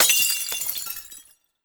Skel Scientist Flask; Legend of Keepers

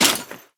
Shield steps Armor; Folk Hero

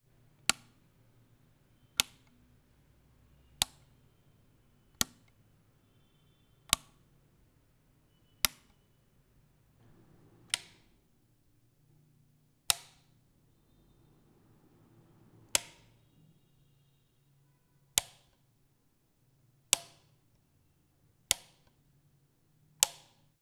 Light Switch, Hotel, Vietnam

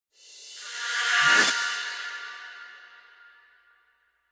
Battle To Main Theme Transition; Pokémon Trading Card Game Live

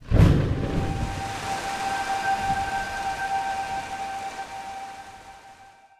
Desert Rain; rabbids multiverse; iOS, macOS, Mobile

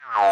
Cartoon, Boing, Jump, Jaw Harp

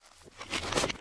Sittable Office Chair Sit

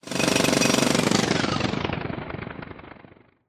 Gnome Jack Hammer

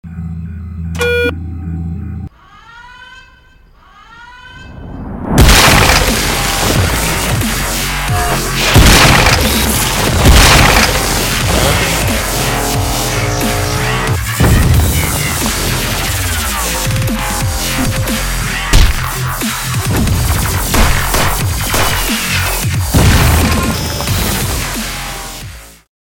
Robot

